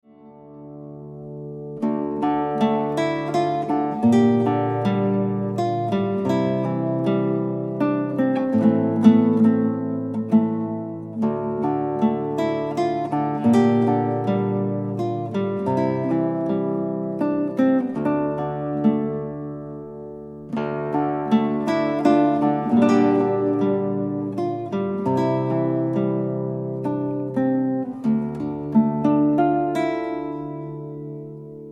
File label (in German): Trauerhaus Instrumental